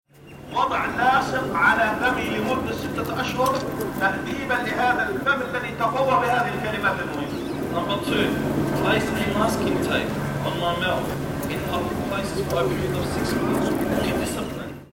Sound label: masking tape